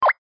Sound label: button